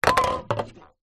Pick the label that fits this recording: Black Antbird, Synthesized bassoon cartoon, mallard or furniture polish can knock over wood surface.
furniture polish can knock over wood surface